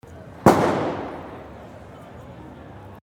Silvester Knaller

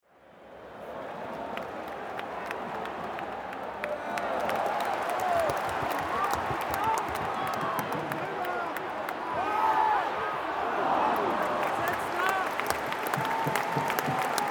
Stadion Atmosphaere

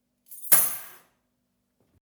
Schluessel schmeissen

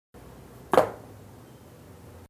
Pflastersteine schmeißen

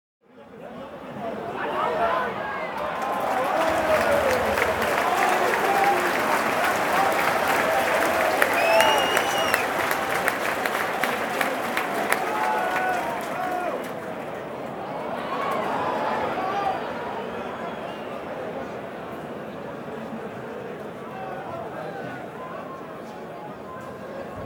Stadion Fan und klatschen

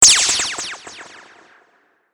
schuss verfremdet

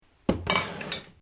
Kanne abstellen

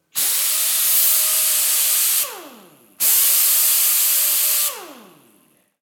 Druckluft Kompressor

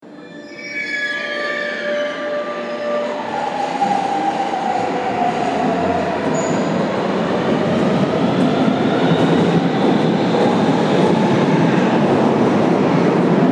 S Bahn Ankunft Abfahrt

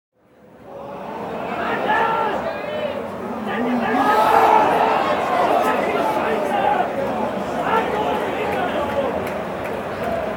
Stadion Buhh und Pfiffe

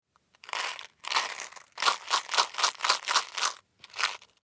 Lutschbonbon

